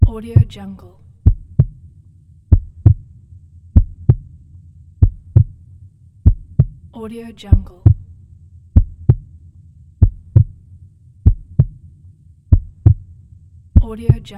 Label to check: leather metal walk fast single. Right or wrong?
Wrong